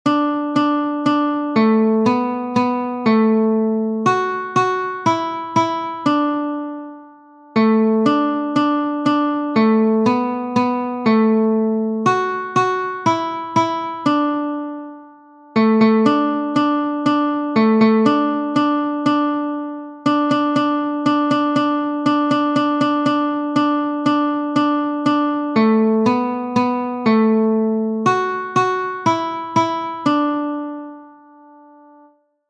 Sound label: Old MacDonald had a farm guitar several strings